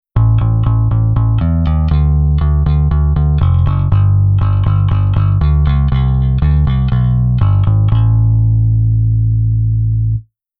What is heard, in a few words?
Bass Velo Strong